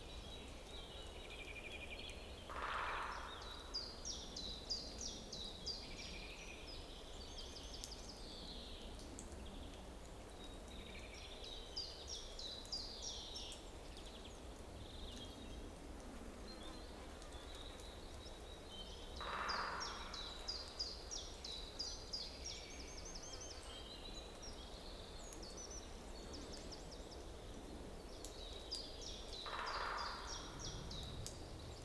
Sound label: Woodpecker Calm Woods